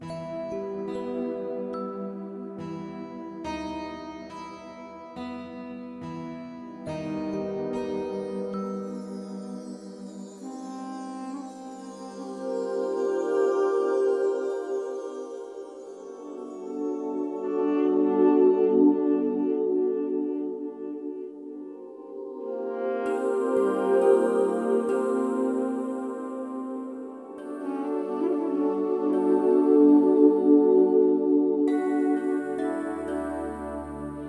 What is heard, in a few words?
Fantasy Exploration loop; Shockwave Sound - Fantasy Worlds Vol II+III